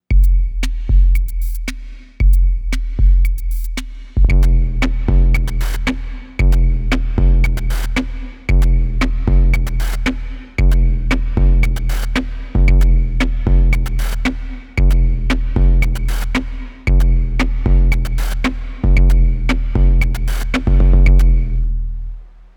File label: synth